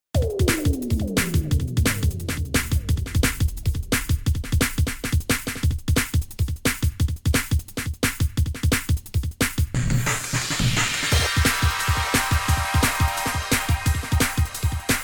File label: Electro Funk